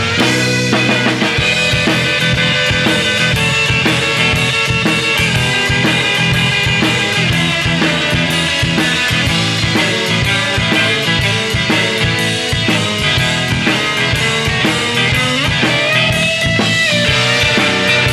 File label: Ride